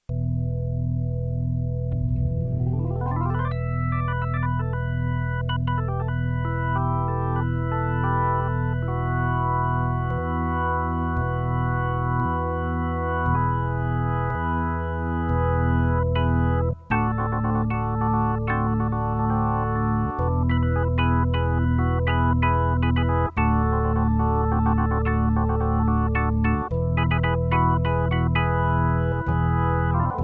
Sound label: prelude